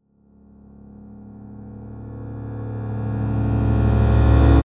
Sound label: Crescendo Tonal, Production, Element, Crescendo, Tonal, Musical; Crescendos